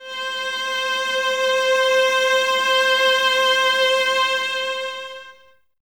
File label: violas C 5, String Ensembles; Miroslav Vitous; VLS 1+2